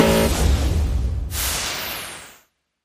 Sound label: gambler lose; Magic Stars 5; Wazdan